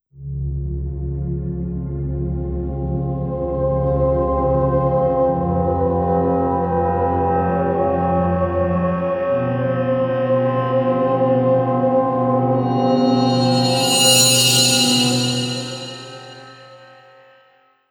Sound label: Distortion; House of the Dead Scarlet Dawn original sound tracks